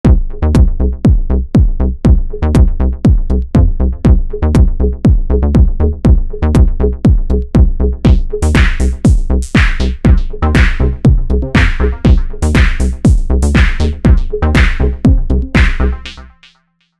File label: minimal